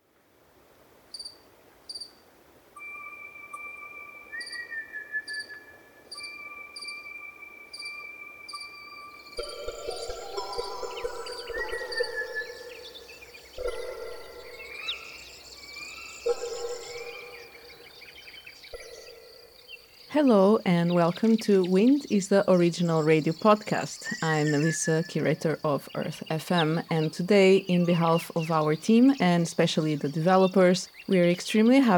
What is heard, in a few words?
Frog Cascades, Western Cascade Frog Pond; Nick McMahan; Wind Is the Original Radio Fridays